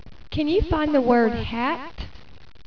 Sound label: HAT